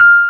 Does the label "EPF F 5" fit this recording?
Yes